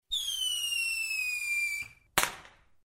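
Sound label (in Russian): novogodnyaya hlopushka, New Year party popper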